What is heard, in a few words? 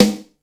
2000s subby snare drum sample g sharp key